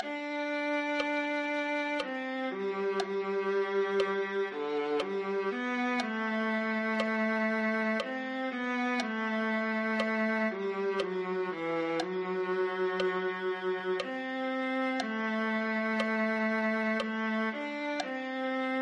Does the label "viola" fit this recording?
Yes